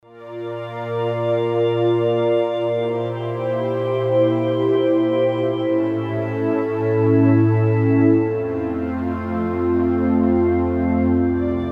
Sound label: Glass Pad, Roland JV-880; Factory Patches